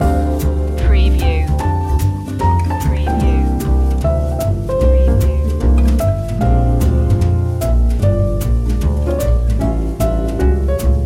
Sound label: what child is this jazz